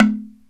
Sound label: ROCK PERC.